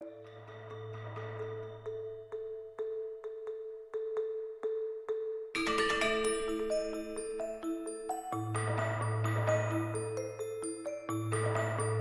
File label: Fire Elemental Gate